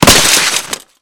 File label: Body Falls Heavy Glas